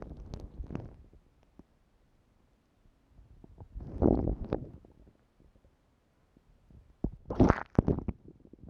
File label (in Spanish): toma mate; Oído Absoluto